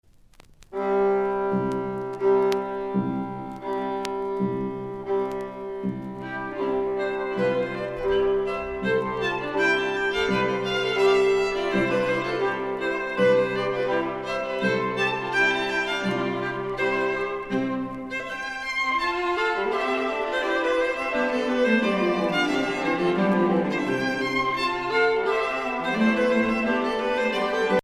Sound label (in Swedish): marsch; dalfors